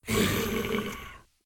Ghoul Growl; SyrinScape; East Ward

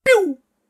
laser guns

